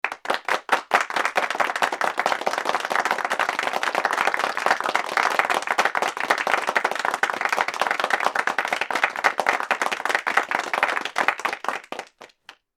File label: Crowd Applause